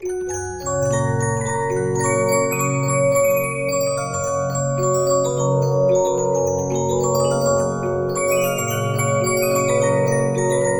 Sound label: Regina, 15inch disc musicbox